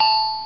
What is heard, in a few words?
VIBRA BEL, bells